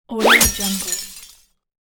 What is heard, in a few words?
shards glass